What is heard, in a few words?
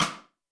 rimshot, Zoom MRT-3B